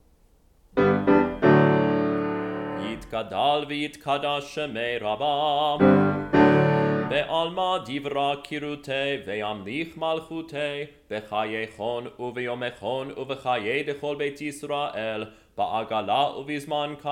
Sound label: Chatzi Kaddish